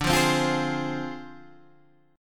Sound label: D Minor 7th Flat 5th Guitar Standard; Dm7b5; strummed